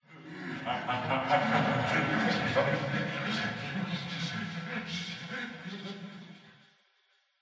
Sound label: Voices Ambient